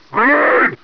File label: throw; hunter